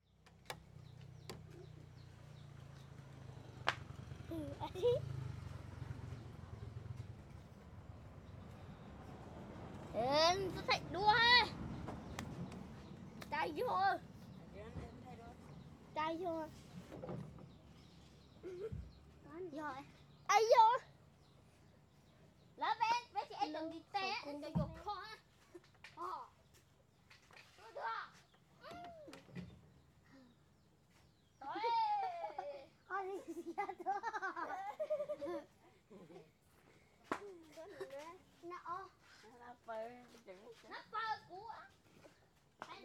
Lao Children Playing

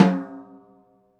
Snare Open High Vol

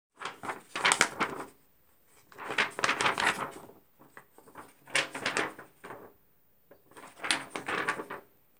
Paper Bending

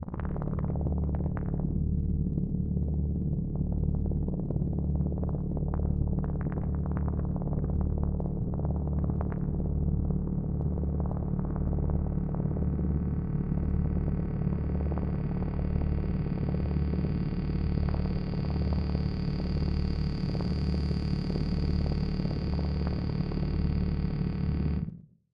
Drilling To The Earth S Core

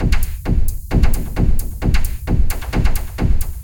Brown noise percussion loop

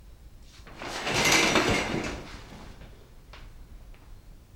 Shower Curtain

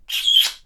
Kisses

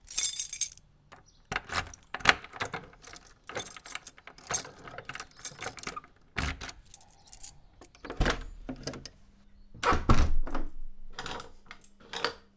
Opening Home S Door

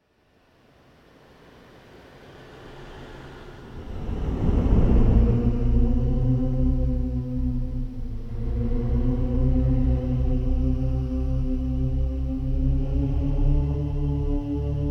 Horror Ghost

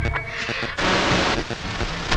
Cell Beeps

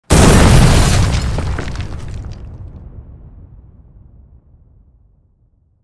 Custom Explosion Building Destruction; Heavens Feel

